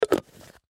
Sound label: Thermos Lid Close; Lids; Objects; Household